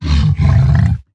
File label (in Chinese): 僵尸疣猪兽愤怒声